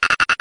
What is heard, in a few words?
cry